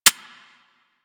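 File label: submachine gun Mech; Warframe; Tenno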